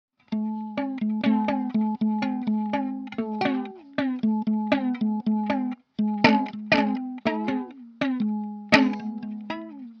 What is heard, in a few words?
Guitar Left On Train